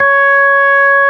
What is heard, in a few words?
B3, hammond; Timidity